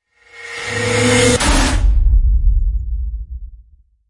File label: Airgun Hit Swell; Ninja Tracks